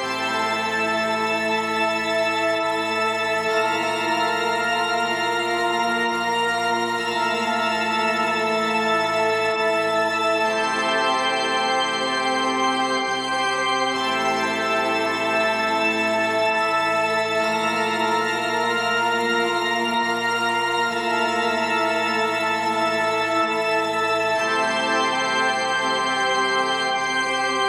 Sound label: Intro, Organ; ash adams gobsmacked; Caesar Intro - Organ